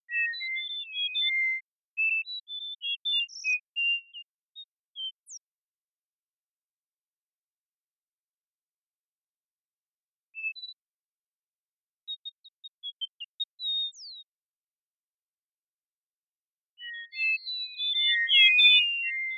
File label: sfx complete